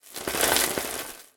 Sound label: surfaces Wood; Land